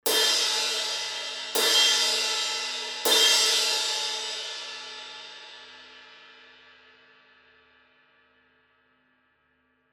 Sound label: zildjian heavy crash hits